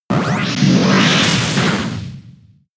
Jump Kick; pokemon sfx gen 7 attack moves sumo usum

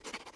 effort; Scrat

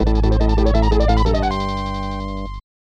Battle Intro; Cyber Knight; SNES

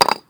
brick hit

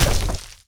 wand down stab impact

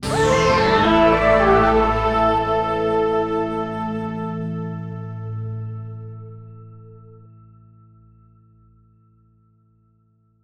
SFX Success; escape academy

